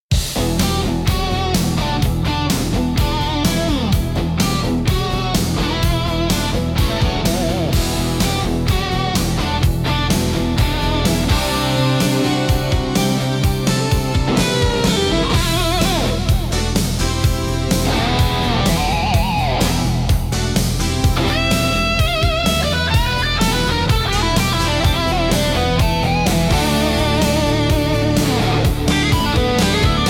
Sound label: lightning rock anthem, podcast intro music